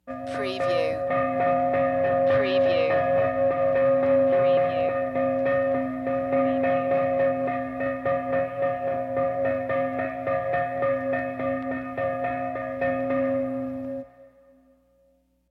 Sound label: Church Bells Constant Fast